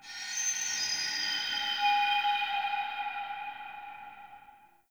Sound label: Root Cave Mysterious Whistle; Ambience; One Offs; Neathuns Root Cave